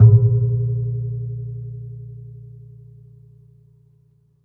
Classical Guitar Marimba Hit, Classical Guitar Extended Technique